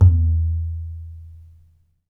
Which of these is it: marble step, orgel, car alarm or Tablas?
Tablas